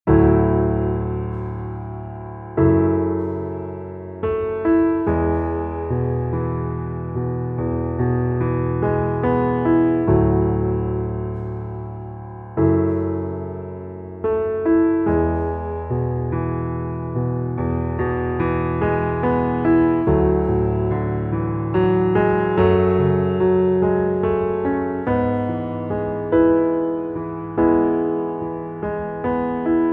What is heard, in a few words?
Underscore PIANO; Worship Flow